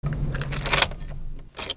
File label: CARS